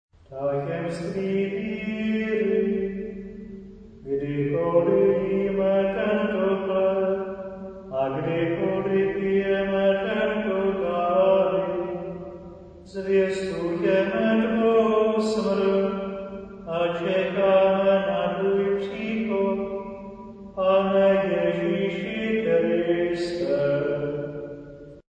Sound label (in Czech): tajemstvi viry choral